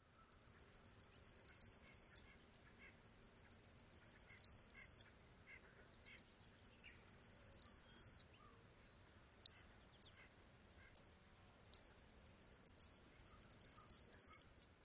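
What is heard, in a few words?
Eastern Kingbird dawn song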